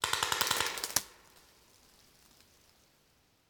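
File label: Paintball Gun Impact; Sound Ideas; Guns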